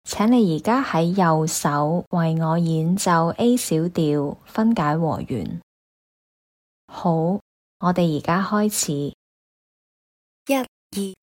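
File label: a minor broken chord rh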